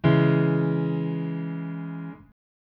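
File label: C sharp m lower, chords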